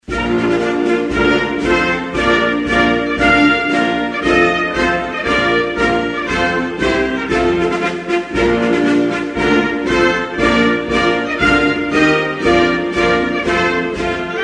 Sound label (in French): Liban, Hymnes nationaux